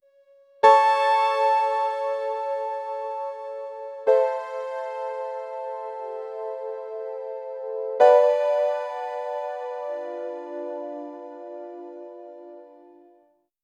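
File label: gentle mysterious background, narration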